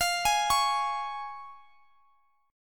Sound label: C sharp Major E sharp